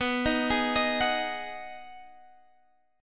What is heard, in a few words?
B 6th B down Guitar Open G